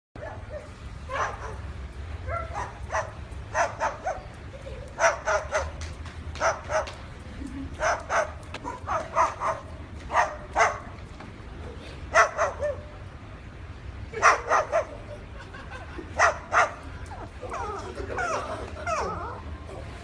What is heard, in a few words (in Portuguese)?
papagaio latindo